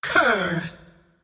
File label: attack whip; Queen of Pain